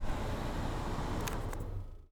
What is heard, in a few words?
Car AC Air Conditioning Soft Switch Off; Nova Sound